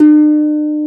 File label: harp